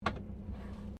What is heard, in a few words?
CAR HOLDEN FE INTERIOR HANDBREAK OFF, Classic Australian cars; Holden Fe 1957; Vehicles